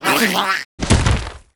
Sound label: die; Maulmouse; Fly For Insanity